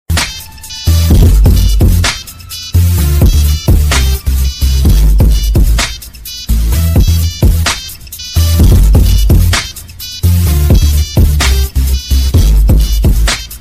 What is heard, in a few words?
nokia remix ringtone